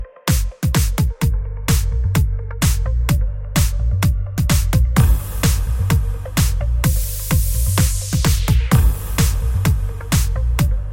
Beat

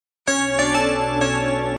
Melody Tone

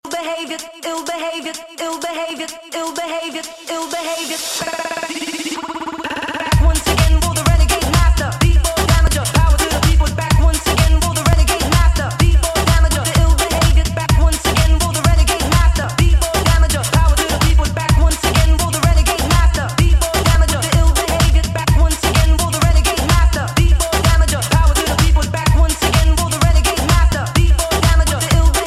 Real House Mix

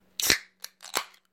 Open Can

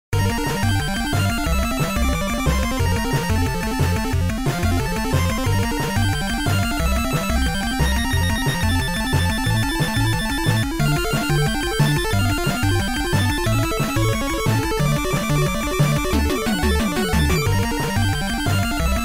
Stars Ringtone

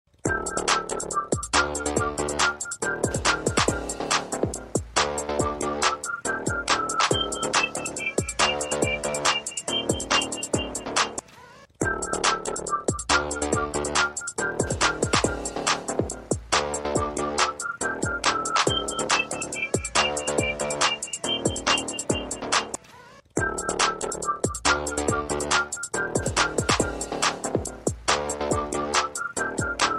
Beat Drop

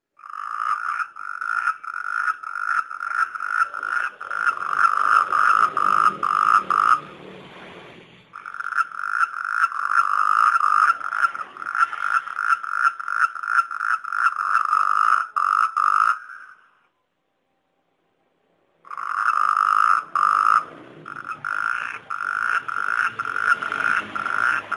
Funny Frog

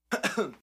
iPhone

